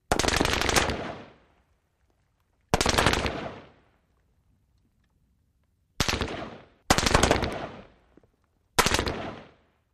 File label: M-16: Rapid Fire With Impacts. Stereo